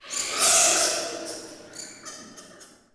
bat; DAoC

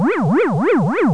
flying; KARUTA; graytown

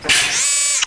Boot Snd; Unreal Tournament Demo; BotPack

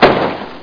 bang; dosgames; brr

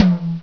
Hi-Mid-Tom, C2; Flying Nightmares Demo

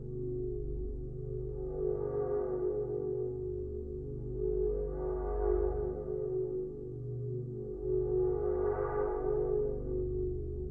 HOLO LOOP; PaxImp; CNTRLRM

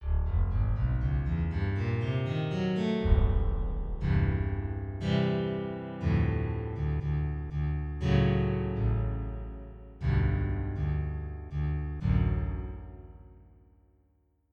Soft Guitar

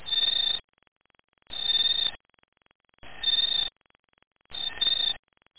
mushi; Internet 1996 World Exposition; Japan; NTT

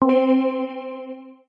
skill up; anito

